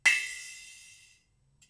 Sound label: Splash, drums singles; The Roots Drumloops